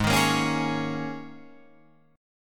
G sharp Suspended 2nd Suspended 4th, G sharp, down, Guitar, Open F; G#sus2sus4; strummed; JGuitar